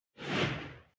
Whoosh Soft Sweep By Squash Racket Light Flange Left To Right